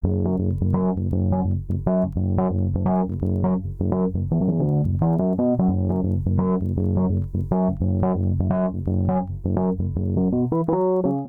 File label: F# Minor 85 bpm, Bassline; MIDI Clips; Tonal; Dirty Back Roads; Ableton; Factory Packs; Chop and Swing